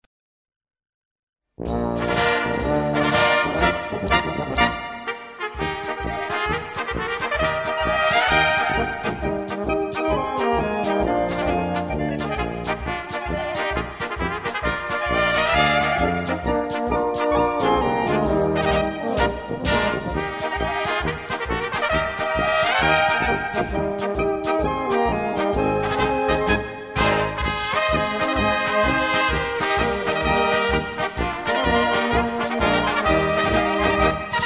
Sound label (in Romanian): Instrumentala